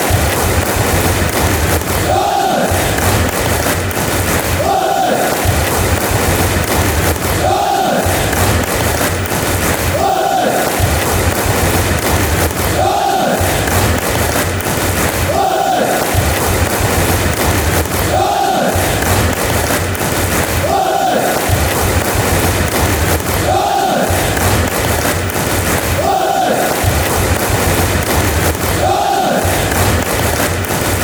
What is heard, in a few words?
hudders clap, fanchants